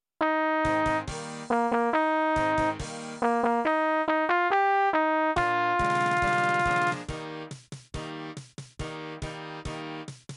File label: Trumpet